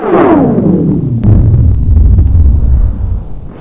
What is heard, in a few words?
pit drone die; svencoop; sectore